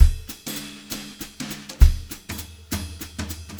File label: 200 JZ BEAT4, Brushed Drum Loops; USB Soundscan vol.39; AKAI; 90 s Sample CDs